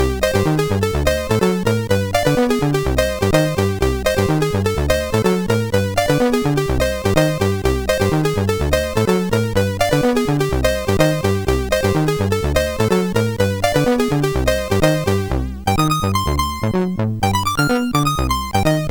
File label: Piano Wamp, MOS6581R4; hvsc